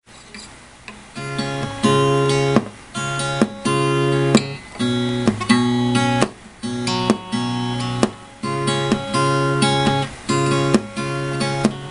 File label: riffs licks and various plucking guitar